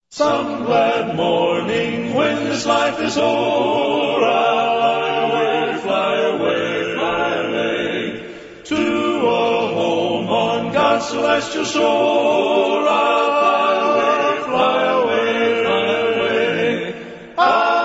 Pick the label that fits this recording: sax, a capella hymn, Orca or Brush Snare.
a capella hymn